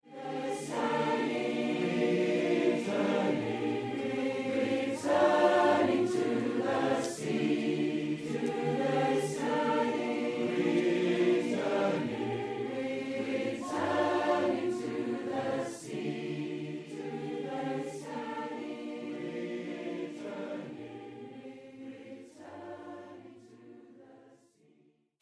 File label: ending, choir; Demonstrations (choir - mp3 clips)